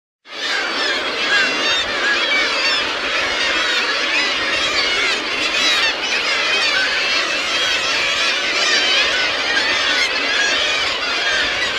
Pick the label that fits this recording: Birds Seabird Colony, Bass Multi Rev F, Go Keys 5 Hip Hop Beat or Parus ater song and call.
Birds Seabird Colony